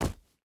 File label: footstep hide gravel jump land; Rust